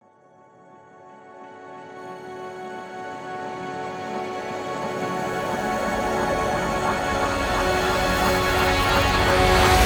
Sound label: Muffler Waves Breaking Intro; forza motorsport 3; xbox 360